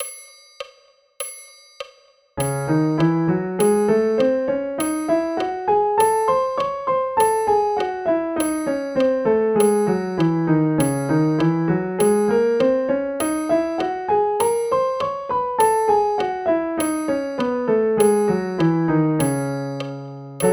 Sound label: C minor scale and arpeggio; bastien